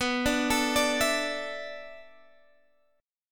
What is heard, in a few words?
A Suspended 2nd Suspended 4th